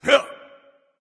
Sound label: grunt jump; smite; voicepack; ravana